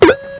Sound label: shoe squeek